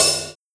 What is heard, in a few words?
HiHat Fat; BJA Pacific